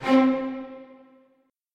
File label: Martellato String